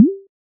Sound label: Message Sent; Skype Sounds